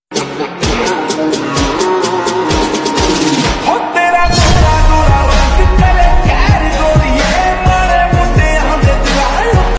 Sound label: slow moo